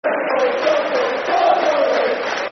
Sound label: Football Chant, Soccer Songs; Paul Hayes; Scunthorpe United Scfc